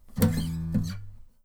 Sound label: guitar deploy; Rust